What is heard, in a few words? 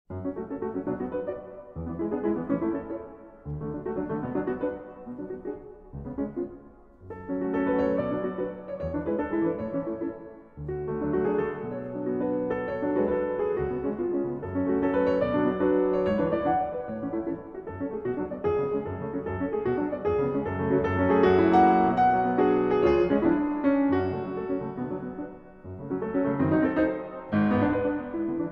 Lied ohne Worte in F-Sharp minor